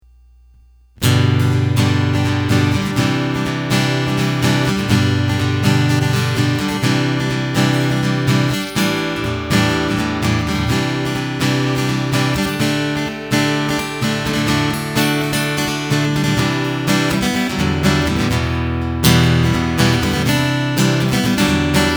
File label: str piezo